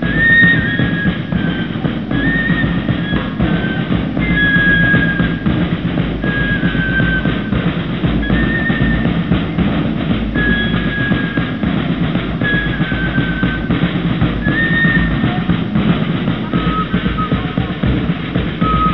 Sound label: the irish